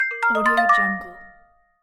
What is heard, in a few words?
magic